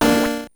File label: multiplier A#4 D5; earthnight switch gamerip 2019